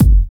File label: thick kick drum sound F sharp key, kick sound tuned to the F# note; kick drum samples